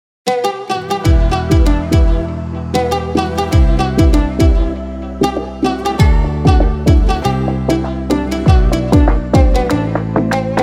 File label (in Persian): صدای زن; irani; song